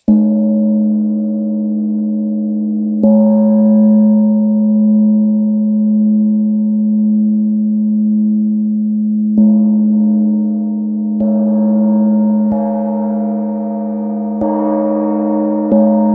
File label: feng 35, vetrny feng gong sonic energy 35 cm